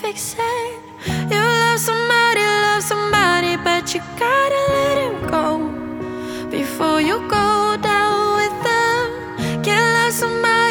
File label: Acoustic